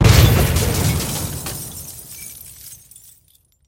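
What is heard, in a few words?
destructive strong explosion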